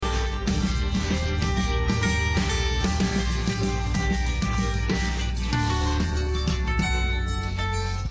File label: no vocals